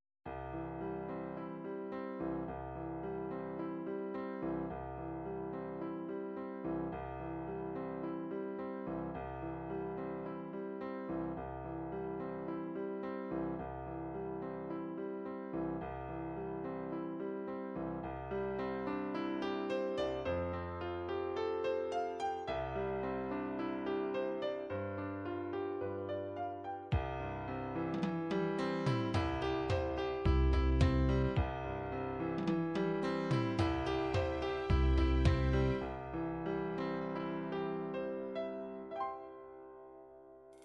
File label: Ballad Piano, midi